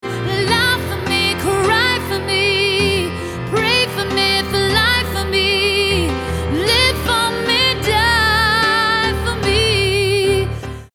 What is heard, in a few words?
Burn